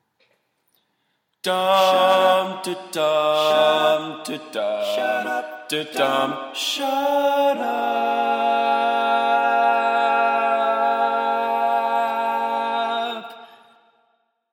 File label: Bass, barbershop tags